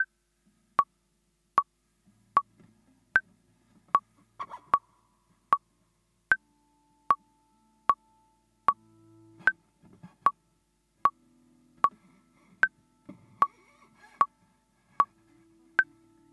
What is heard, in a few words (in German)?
Samba für zwei Gitarren 2 Gitarre